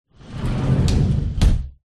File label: household door sliding patio